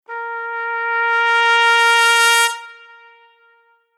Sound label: Horn Exp Cresc